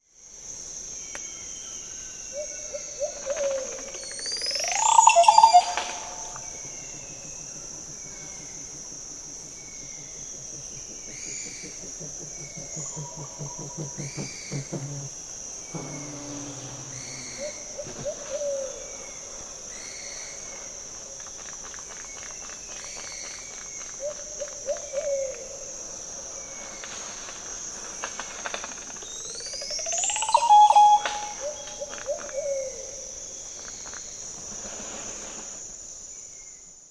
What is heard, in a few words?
Green Oropendola